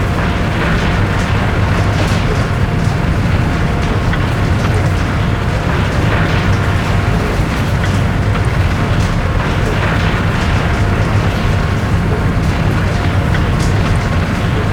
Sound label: Upper Elevator Ride